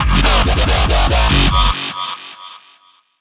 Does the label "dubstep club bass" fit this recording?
Yes